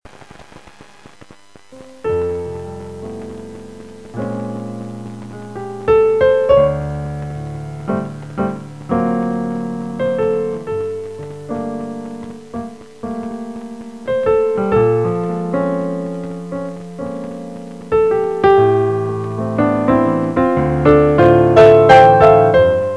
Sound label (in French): essai dowar piano; orgue